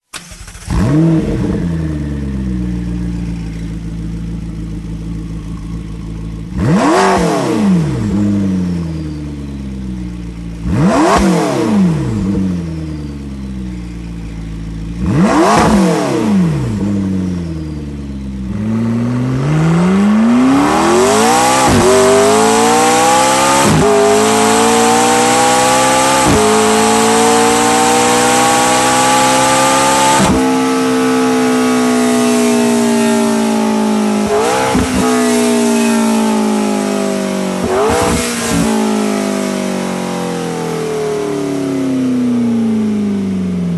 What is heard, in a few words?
slip on McLaren 650S